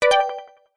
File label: game tone point awarded collect item synth delayed